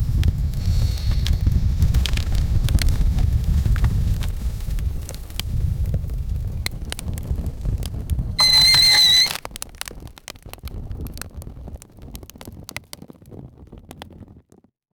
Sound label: create an artistic sounds, horses